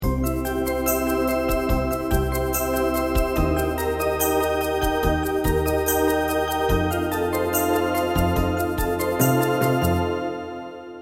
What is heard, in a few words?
upbeat prelude, loops